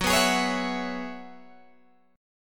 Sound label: F sharp 7th Suspended 2nd Suspended 4th F sharp down Guitar Open G